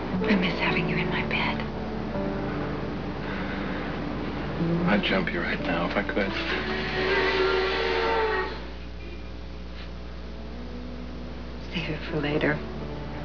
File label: jump